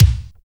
POWER KICK; Drums In Your FACE!

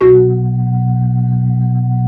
B-3, Blues Perc; Keyboards of The 60's and 70's

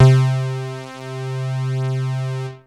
Techno leads, hits and riffs

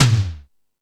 Fusion Stix, Drum Machines

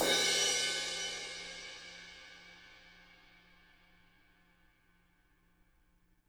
CRSH, CRASHES

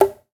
Alto Flute Key; Digital Sound Factory Virtuoso

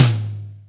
Acoustic Tom, Yamaha DTXpress

